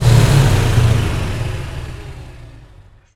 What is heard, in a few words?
Warrior Engine Acc End; DCS